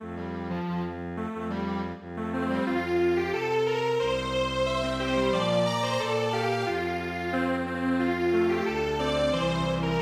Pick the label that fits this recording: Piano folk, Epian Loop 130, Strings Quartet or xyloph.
Strings Quartet